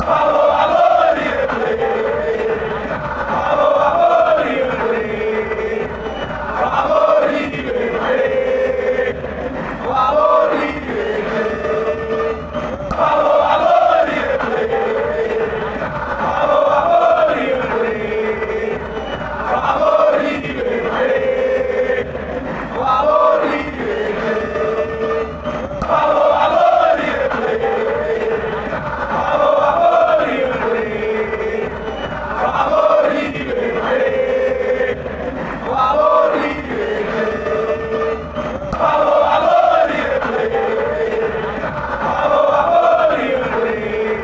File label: come on come on come on river plate fanchants